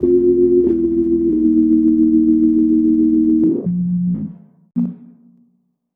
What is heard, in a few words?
Lead Lofi Weirdness, Drum & Bass Keys; Sonic Foundry (Sony Creative Software); Knutrix Drum & Bass